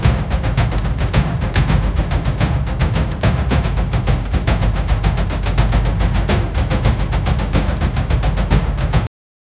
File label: military drumline loop; marching